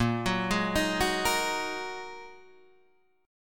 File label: A sharp 7th Suspended 4th Sharp 5th down Guitar Half Step Down